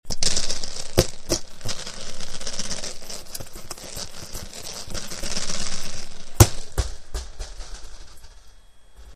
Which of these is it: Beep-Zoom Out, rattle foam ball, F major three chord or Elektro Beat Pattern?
rattle foam ball